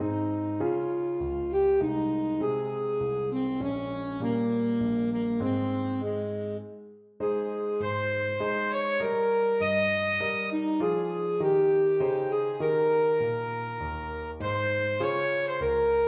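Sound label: sarabande alto saxophone; couperin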